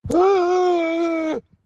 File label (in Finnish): huuto